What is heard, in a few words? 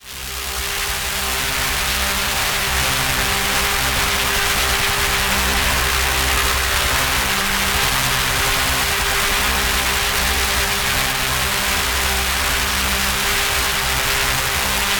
Atmosphere chaos theory; sumo blanco